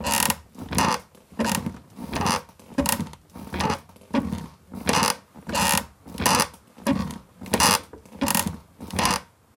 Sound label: chair frame metal creak squeak loop